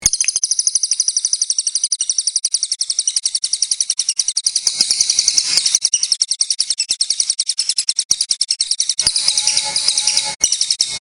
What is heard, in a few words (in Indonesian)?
suara burung cililin shakira